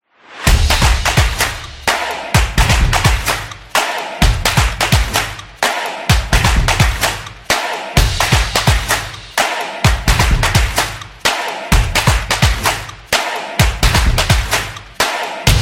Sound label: Opener Stomp